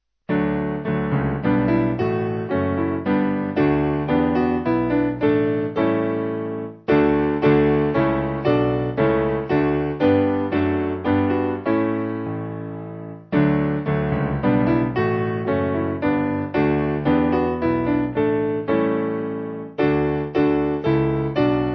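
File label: Piano, Music Backing; Jesus Has Come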